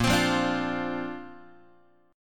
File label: Bb Major Add 9th, Bb, down, Guitar; strummed; JGuitar